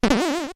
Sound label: Bounce; Bouncing off a spring; Zelda